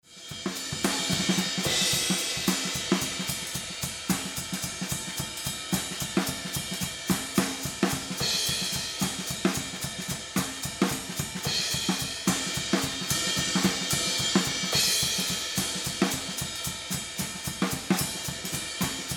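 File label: Drums hi-hat Ride Pan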